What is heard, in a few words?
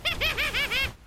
teen witch laugh